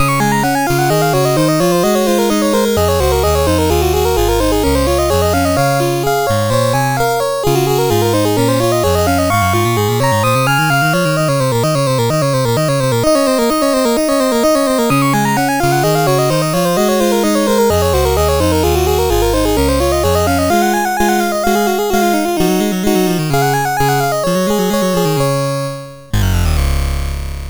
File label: Keyboard Piece C Sharp Major, CSG8580R5